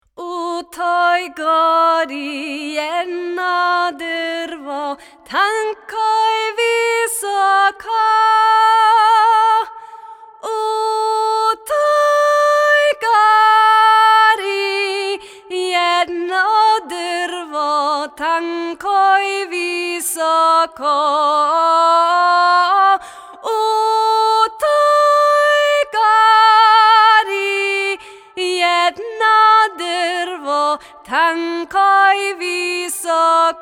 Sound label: Vocals Verse